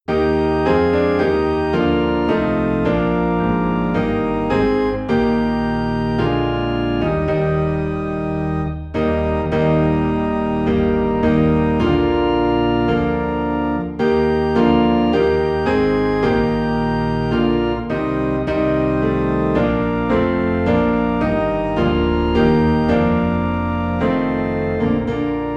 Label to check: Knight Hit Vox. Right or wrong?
Wrong